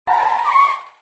Car Brake

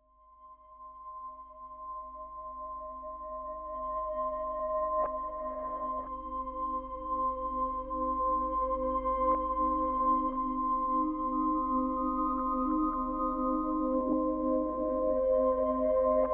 music

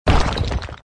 Sfx Enemy Golem Dead

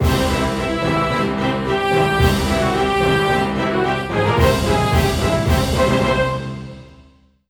Battle Cleared; Mario + Rabbids Kingdom Battle; Mystic Battle

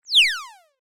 Fall Sound; Bloodungeon

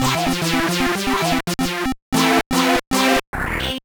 Jingle; ATV Quad Power Racing; GBA

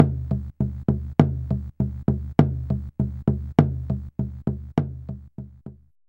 Ditty; NBA ShootOut; PS1

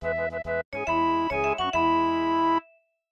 MIDI Animation stings

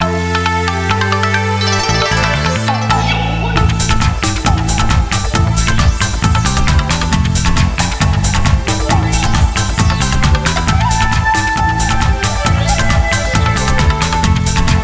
Slot Bonus Win; Naruto Shippuden: Ultimate Ninja 2

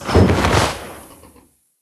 Goblincannon Explodes; SFX Level11; Kingdom Rush Vengeance; tower defense

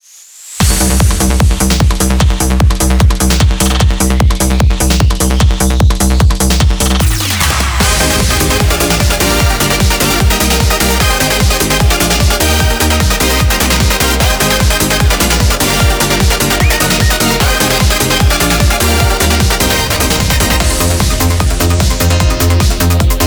instrumental; Super Forest Beat vol3 2018

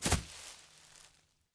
foliage land; teardown; ps5 windows xbox series xs

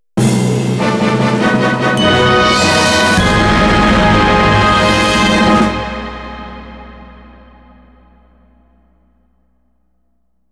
Victory Fanfare; BattleFantasia

